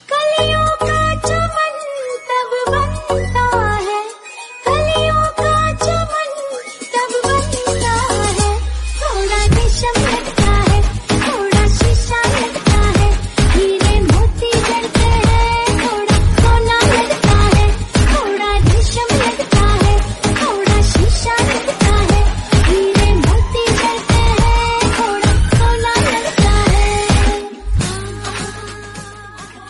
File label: lofi hindi